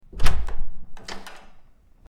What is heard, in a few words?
door opening